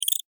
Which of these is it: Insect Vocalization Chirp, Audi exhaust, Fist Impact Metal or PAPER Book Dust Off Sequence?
Insect Vocalization Chirp